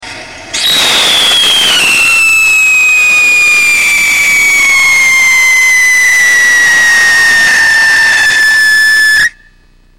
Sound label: dichromate with core, rockets whistle tests; Potassium Dichromate; Sodium Salicylate; Poisonous Mix - Use protection - Length of burn may have been due to overload by a few grams. Speed similar to no catalyst